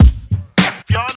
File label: 104 bpm breakbeat sample c key, kick tuned to the C note